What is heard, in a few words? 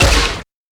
Hit